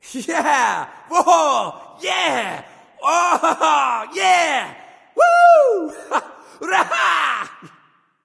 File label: nv yay; smite; voicepack; xbalanque